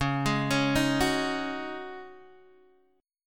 Db Suspended 2nd Suspended 4th Guitar Open D

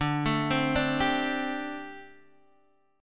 D 7th Suspended 4th, D, down, Guitar Open D

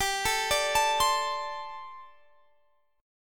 G Suspended 2nd Suspended 4th; Gsus2sus4; arpeggiated

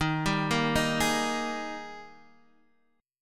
G sharp Major 7th Suspended 2nd

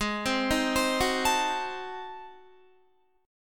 G sharp 7th Flat 9th G sharp down Guitar, chord; G#7b9; arpeggiated

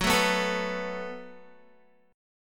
Gb Minor Double Flat 5th, Guitar; strummed; 13,12,11,14,11,x; 4F,4A,5C,5G,5C,6E

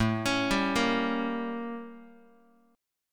A 7th Flat 9th Guitar; A7b9 arpeggiated; JGuitar

